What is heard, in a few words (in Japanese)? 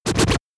bamenntennkann